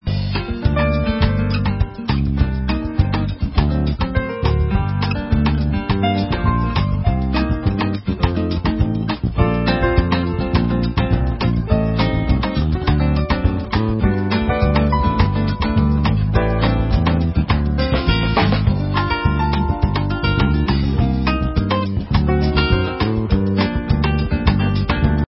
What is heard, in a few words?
music; Mermaid Barista Latte Art